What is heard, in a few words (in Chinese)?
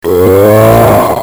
huhou; battle